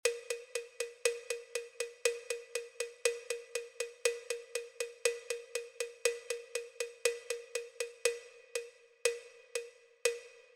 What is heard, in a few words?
Tempo Test 60bpm